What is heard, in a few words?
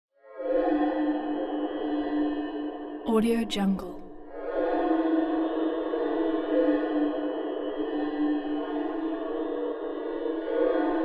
pulse ring constant looped rhythmic squawks